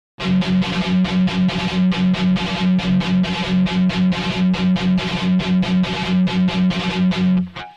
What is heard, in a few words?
thrash